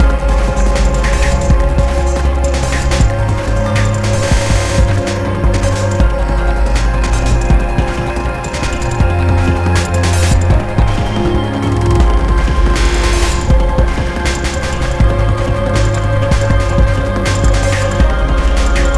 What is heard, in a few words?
Waterworks Murky Medium; rive wreck hack die retry; ps4